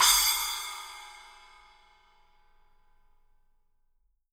8 SPL 22, FX CYMBALS, Living Drums; Sampleheads; Peter Erskine Living Drums

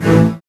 SYN STRSYN, dance synth; Sound & Vision - Gigapack I CD 2

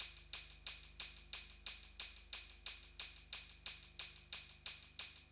Tambourine Loop; Sonic Foundry (Sony Creative Software) - Fluid Dynamics Drum & Bass; Construction Kit; Percussion

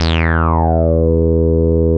E3, Bass; CRANDLE; E-MU Producer Series Vol. 6 – Analogia Project (CD 1); Boom da Bass 1

